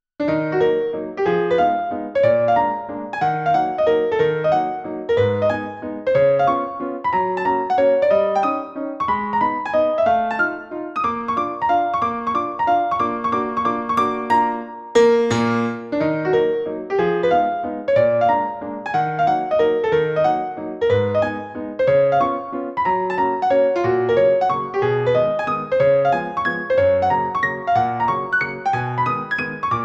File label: Polish dance; synth